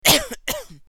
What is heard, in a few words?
Cough; Assemblee SFX